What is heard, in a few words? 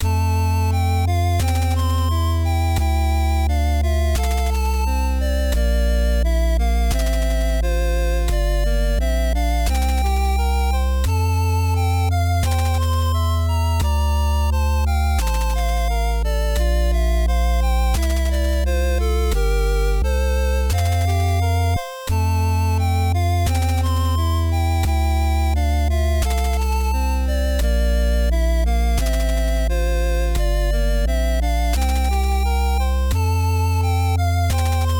chiptunes, happy; toaster intro